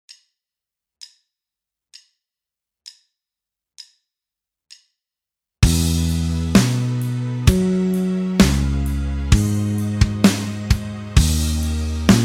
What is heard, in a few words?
backing; In The Woodshed; Guitar Techniques 2017 Issue 274 October